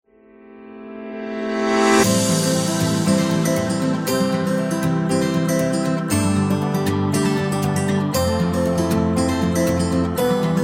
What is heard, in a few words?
Upbeat Love